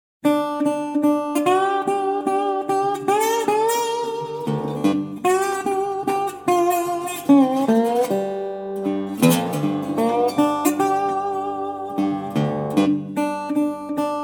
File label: Blues